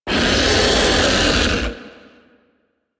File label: Vocal Attack Short, Enemies; Warframe; Teralyst; Ropalolyst